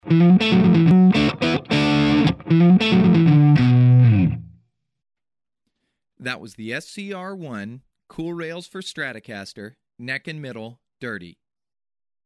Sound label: Neck Mid Dirty; SHR1; micros pour guitare